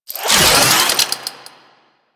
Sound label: Necramech Repair Drone Claw Spin; Warframe